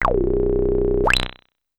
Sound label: BASS Stir bass; Polyend; Legowelt